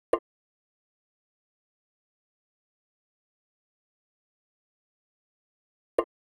Drum Loop, 82 BPM Percussion; Cymatics; Vibes II Drum Loop Collection; Distance Drum Loop

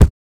Short foley

